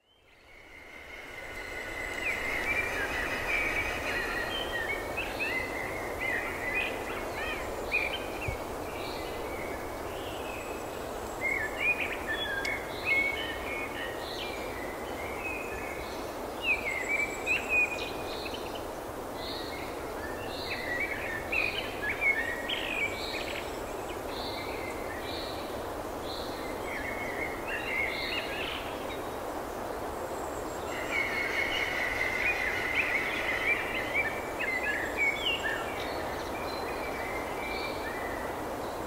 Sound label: Natures Harmony alarm melody; Mudita Harmony